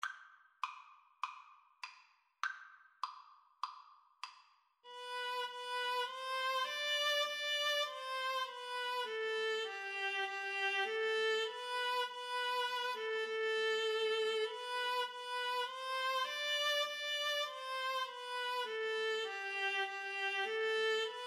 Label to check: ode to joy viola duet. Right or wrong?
Right